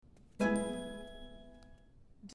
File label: skill level up; sims 4